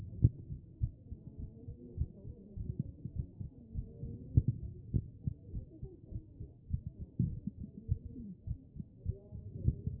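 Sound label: anterior chest, position 4; B MODE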